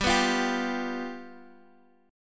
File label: G 7th, Guitar; strummed; 15,14,15,12,x,x; 4E,4A,5D,5G,5C,6F